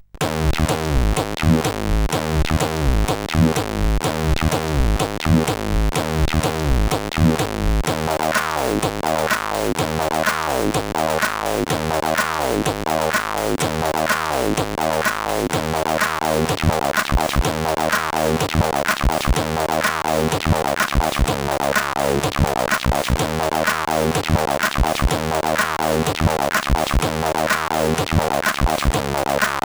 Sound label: Techno House, sid CSG8580R5; hvsc